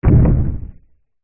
fantasy giant single footstep distant heavy wood; zapsplat

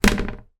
toilet seat plastic up; household

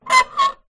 metal scrape on metal small; foley

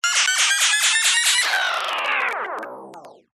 glitch power down; sound design

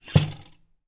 household kitchen knife pull out of holder

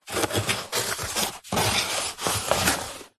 cardboard flat unfolded parcel box flap movement

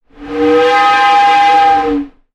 sound design effect air pipe pressure

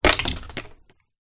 stick small branch fall hard ground; foley

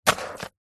household mop single wipe movement on hard floor

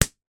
human punch slap face; sound effects one

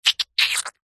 cartoon squelch squish mouth saliva

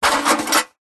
impacts metal small tin like hollow hit bash

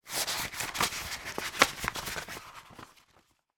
poster rolled up paper map unravel; foley; zapsplat

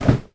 foley footstep wooden staircase single; zapsplat

